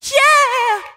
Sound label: "yeah", sung